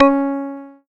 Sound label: C#, Synclav FM PLUCKED; Big Fish Audio